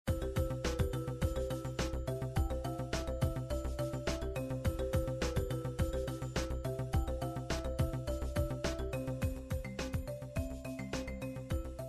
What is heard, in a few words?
melody